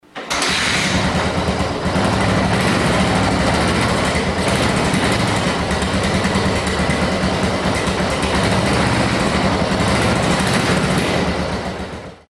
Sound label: yamaha 550 xt, motos